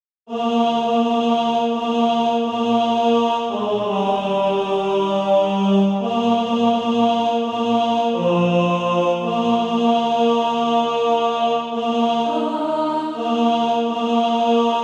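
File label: tenor, SATB Practise Music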